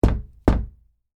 Door Knock French, Foley, Door, Knock, French, Door, Glass, Twice; Blast Wave